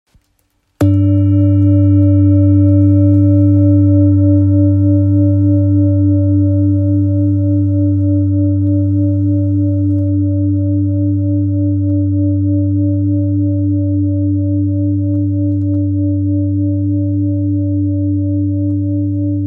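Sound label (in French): Bol grave fleur vie sol, bol chantant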